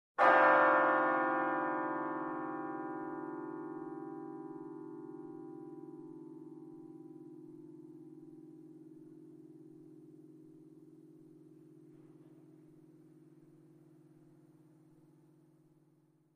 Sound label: Bell Tower Medium Per; bell towers